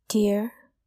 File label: deer; domestic and wild; eng